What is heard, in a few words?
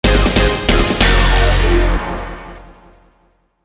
(Jingle) Game Over; Go Series 10 Second Run; DSiWare